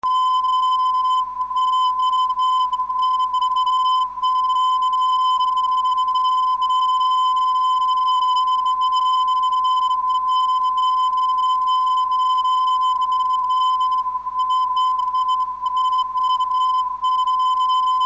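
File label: oo 265khz, non-directional beacon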